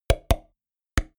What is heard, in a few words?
drop wood